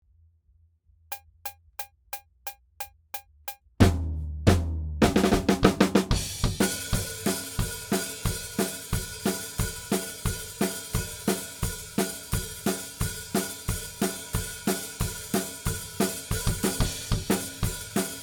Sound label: Drums ONLY